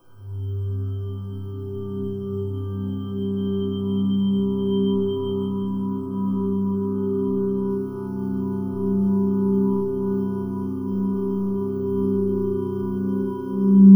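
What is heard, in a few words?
C4 ATMOSPHERE; NorthStar - The Wizard VOL-2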